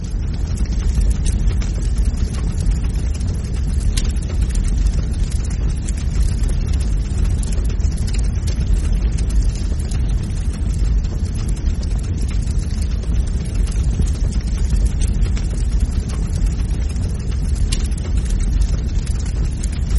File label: Fire Sound Ringtone